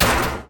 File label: Drone